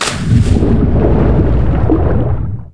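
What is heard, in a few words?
jump air underwater, impact water; SINDEMO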